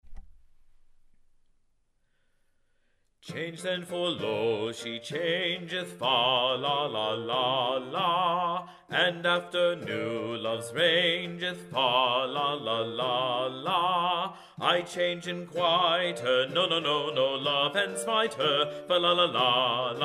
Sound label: Bass; Change Then, For Lo She Changeth, W. Holborne